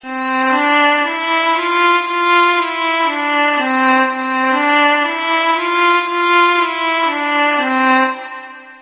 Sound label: low scale